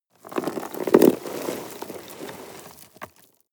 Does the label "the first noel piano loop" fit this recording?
No